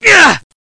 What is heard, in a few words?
Augh!; Education Publishing Concepts